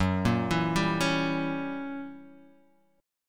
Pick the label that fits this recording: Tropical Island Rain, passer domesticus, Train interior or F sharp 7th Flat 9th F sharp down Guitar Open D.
F sharp 7th Flat 9th F sharp down Guitar Open D